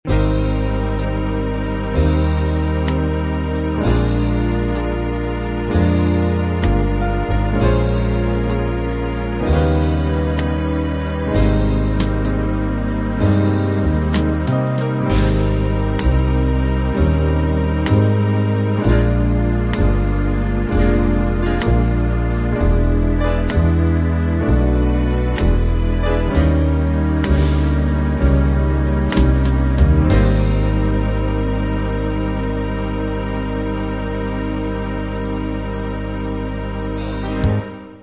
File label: jazz ballad